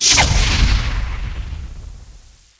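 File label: fireball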